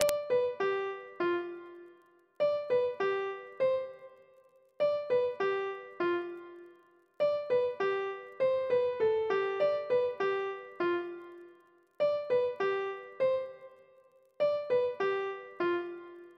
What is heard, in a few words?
Riff; La Bastringue